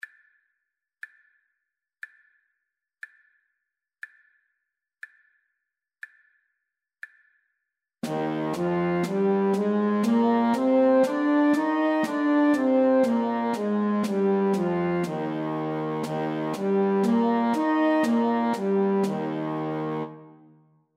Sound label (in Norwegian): Ess-dur natura sakte